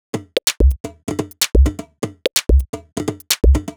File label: Top C# 127bpm, House Drumloops; Zero-G House Fabrik Drumloops; ZG Drumloops